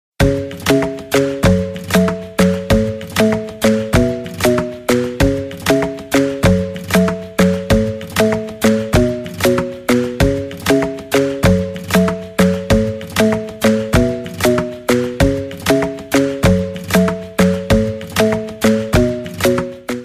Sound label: pop ringtone